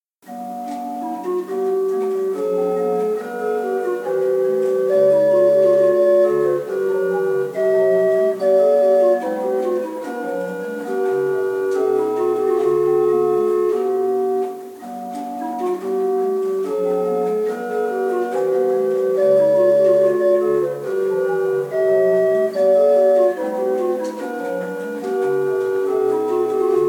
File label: Seifert, pipe organ